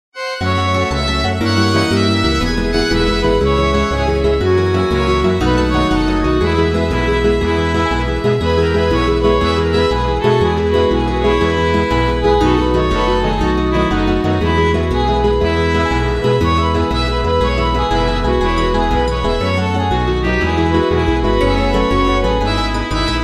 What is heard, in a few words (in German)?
hintergrundmusik; astroklang